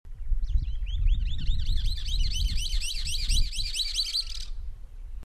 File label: Drymornis bridgesii